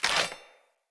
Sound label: Chop Entrance Axe Pull; Sinecure